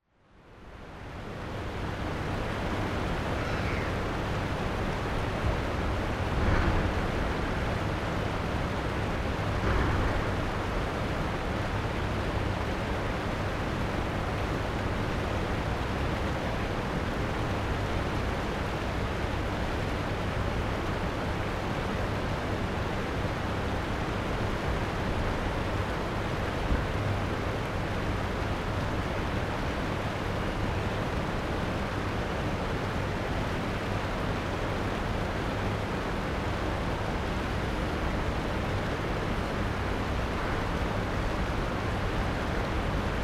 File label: The Wandle Creek; Bell Lane